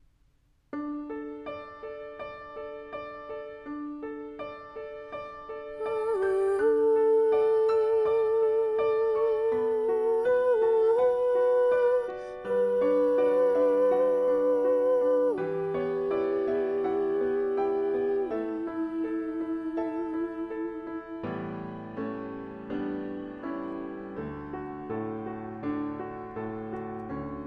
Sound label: soprano